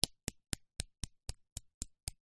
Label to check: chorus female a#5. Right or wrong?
Wrong